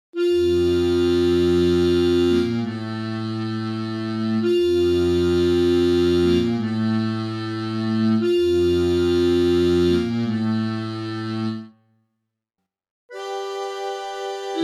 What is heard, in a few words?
MicrOrgan open closed; Reeds